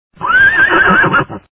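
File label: horse; kun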